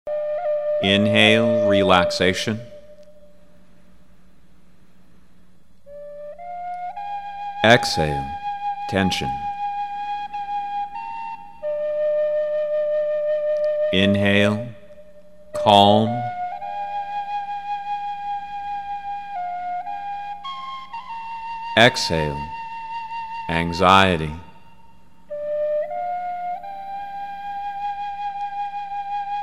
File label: Inhale Exhale